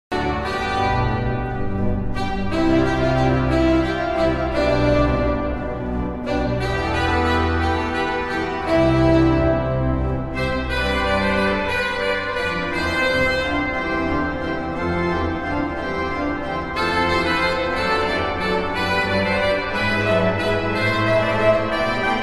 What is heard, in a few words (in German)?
C-Dur Fuge